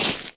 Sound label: stomp deep snow; Scrat